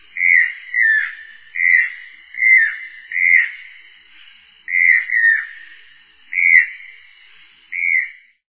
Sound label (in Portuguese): tucano de bico preto